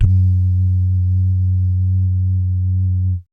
BS DUMMP; Spectrasonics; Vocal Planet; Jazz FX

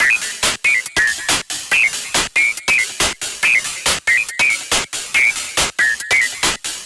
Distorted Loop, Drums & Percussion, Electronic; Sonic Foundry (Sony Creative Software) - Electronic Point Blank

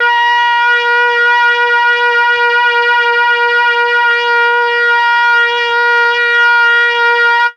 MEL BRASS; ILIO; Propeller Island; Legendary M400

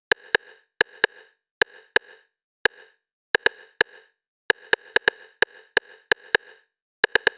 Click Loop, 130bpm; Zero-G; Soundclash Nu Dance Electro; Construction Kits; Feet First

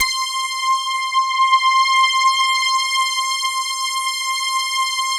MEG, synthesizer bass; Synthesizers; Soli-Music; World of Synthesizers VOL-1

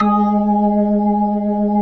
B-3, Jazz

